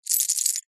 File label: board game tokens metal handful movement hand; monopoly